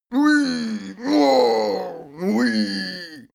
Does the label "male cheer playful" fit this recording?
Yes